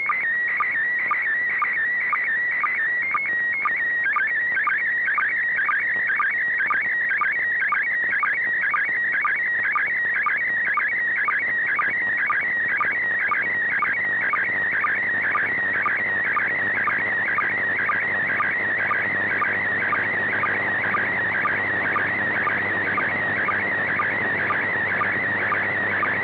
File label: END ISS